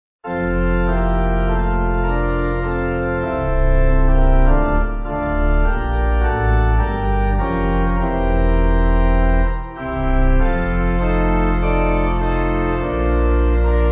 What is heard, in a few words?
Pipe; Dalehurst